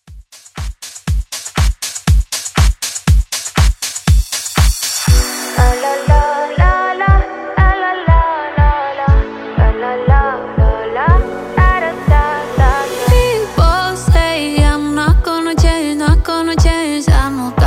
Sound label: Dance Redrum